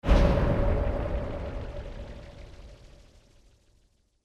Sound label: Burst Flutter